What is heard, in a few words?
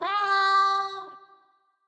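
Music Maker Instrument 3 G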